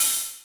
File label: Clatter, Alesis D4 drum machine